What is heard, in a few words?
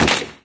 Liqourice Lock Broken; Candy Crush Saga